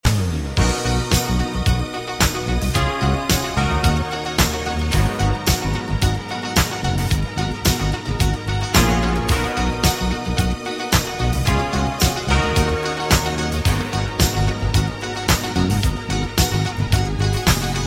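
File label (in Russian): ретро звонок без слов стиль adult contemporary pop музыка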